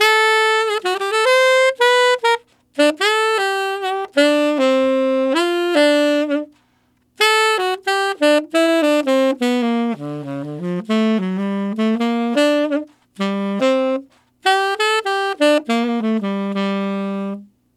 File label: Tenor Swing in G Min, Tenor Sax Loops; Sonic Foundry (Sony Creative Software); Jazz Solos and Sections